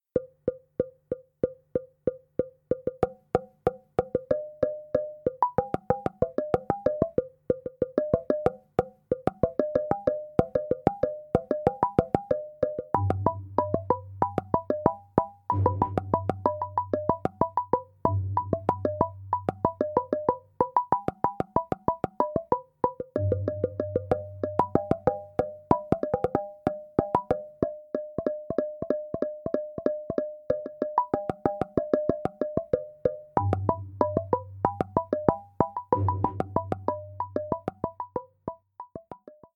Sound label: Bright Interlude Perky